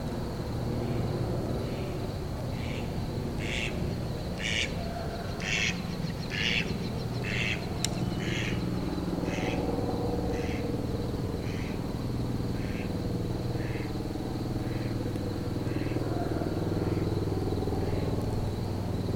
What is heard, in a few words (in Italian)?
Germano reale Anas platyrhynchos; Romain Leuthold